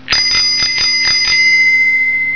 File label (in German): klingel